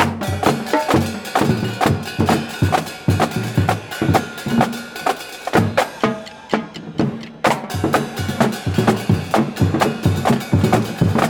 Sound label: marching band; Golden Crayon Sounds